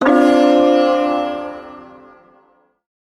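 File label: Shawzin Major Chord; Warframe; Duviri Ersatz Shawzin; Chords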